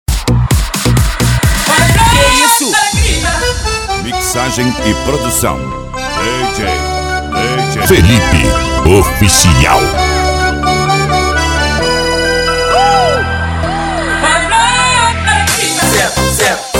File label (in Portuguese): eletro funk pancadao